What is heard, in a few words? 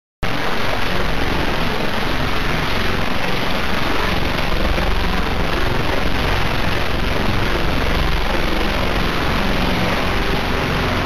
Signal Mettus Audio